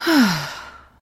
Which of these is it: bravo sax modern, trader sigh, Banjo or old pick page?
trader sigh